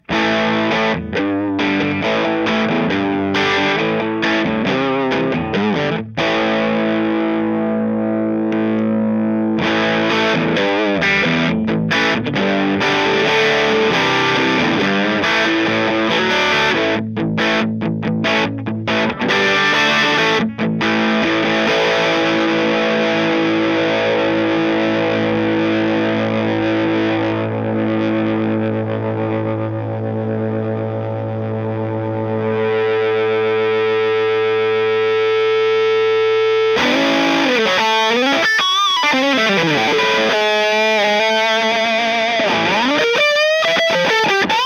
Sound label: strat orange dirty; coron distortion10